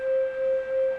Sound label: klaxon, Train; OpenBVE